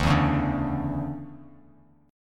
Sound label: D Major 7th Suspended 2nd, Bass Standard; strummed; JGuitar